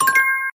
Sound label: single click